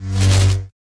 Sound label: lightsaber whoosh